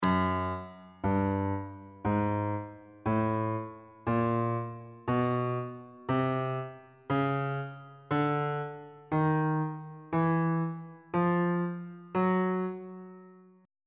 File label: f sharp mixolydian mode chromatic scale 1 octave on bass clef